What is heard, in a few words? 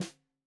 Snare 65 Reg Stereo, Deeply Sampled Drums